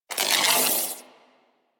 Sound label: Ball Held Spark; Missions; Tenno Ball